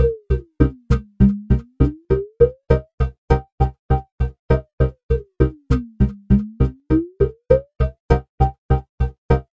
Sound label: 100 gated bell guitar; SYNTH AND ELECTRONIC LOOPS